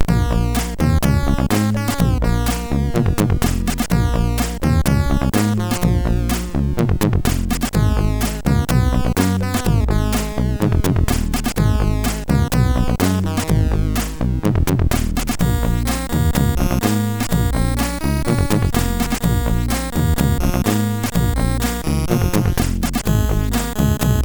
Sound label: Music Bass, MOS6581R4; sid